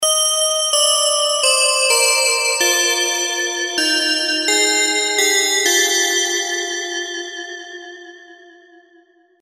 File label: bells